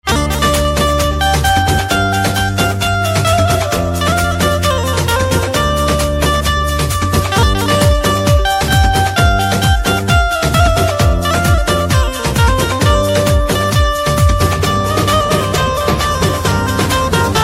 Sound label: Banjo